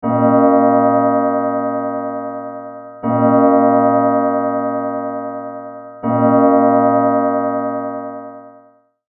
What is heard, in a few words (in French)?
clocher rev